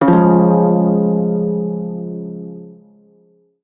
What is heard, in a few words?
Shawzin Phrygian Chord; Warframe; Prime Shawzin